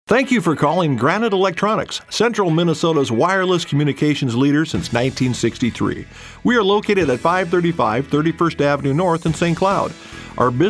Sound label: On Hold; Granite Electronics